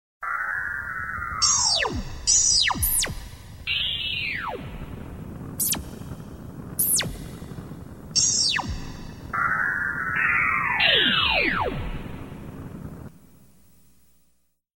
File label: synthesizer; SOUND EFFECTS1